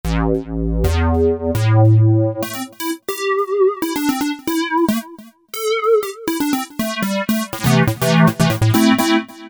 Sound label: Square Comp, Korg MS2000; Factory Patches